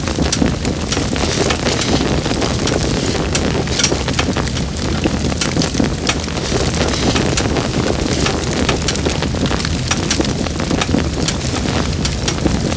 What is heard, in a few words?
fire metal small